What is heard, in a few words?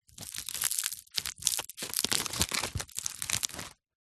foley hat sombrero handling